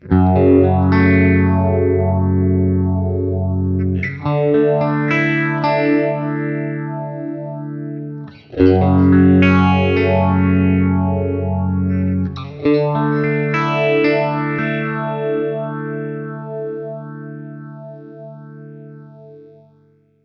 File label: Retro Phaser; Boss Katana Go